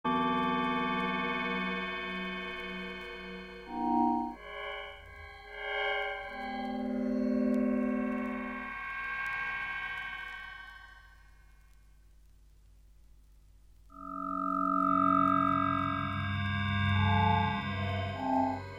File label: Noise Electronic Music